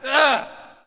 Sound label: Hockey Male Hurt; SFX Kit; Hockey Vocal Sounds